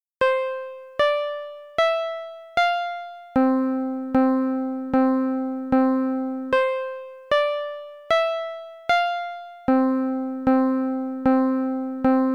Arcade Speaker Test; Taito

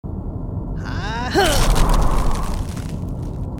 Wizard Magic; Infiltrating The Airship; Brig

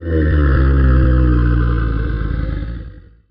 Ambient Camel

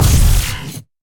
Sci-Fi Weapons Machine Gun Single Shot; Robobeat

